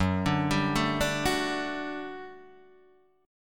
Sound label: G Major 7th Suspended 2nd Gb